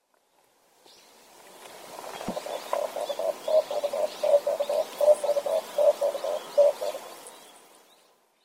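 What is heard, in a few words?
Red Turtle Dove; benibato; yonaguni; koe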